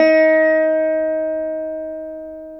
veena D#5; Spectrasonics; Heart of Asia

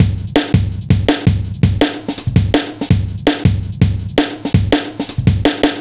165, Drum Loops, Drum & Bass

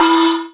ROCK GONG, MPC-60 DRUMS; Greytsounds VOL-1

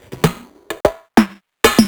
Fills 128BPM, Clubsounds

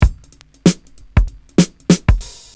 OLD SCHOOL, Breakbeats

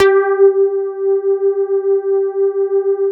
SYNBASS; Synthesizers; Soli-Music; World of Synthesizers VOL-1

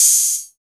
HH, Drum & Bass, 160 BPM; Ueberschall; Drum & Bass Flash Trackz

